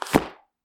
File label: put books